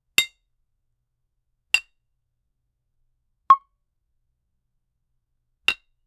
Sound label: bottle cork pop; drinks